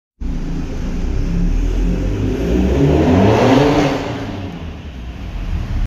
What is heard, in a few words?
parking lot